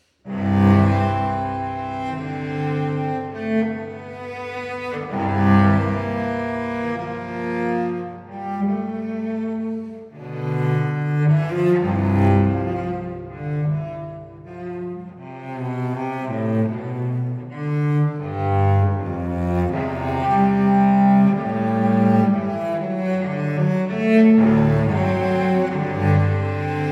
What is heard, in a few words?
Suite in C Major, Sarabande; Suite No. 3; BWV 1009